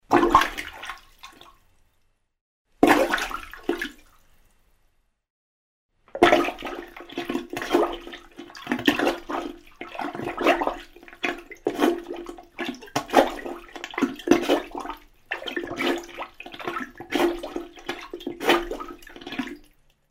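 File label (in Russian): zvuk vantuza, plunger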